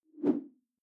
whoosh simple short tonal low

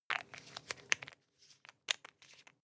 toothpaste close rattle soft; haus-garten; badezimmer

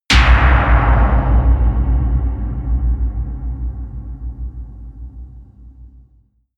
fire boom

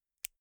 insert xlr extension latch soft; objekte; elektronik

